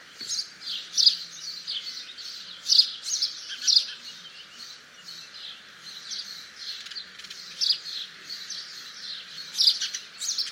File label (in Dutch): Mus Passer italiae